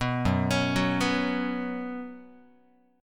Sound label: F sharp Suspended 4th, Guitar; arpeggiated; F#sus4